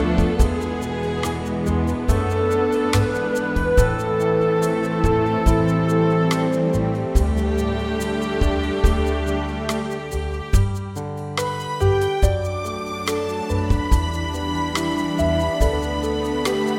G orchestra

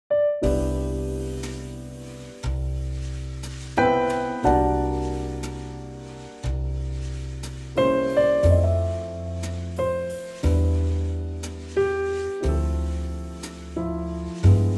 trio C

